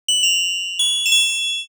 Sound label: furin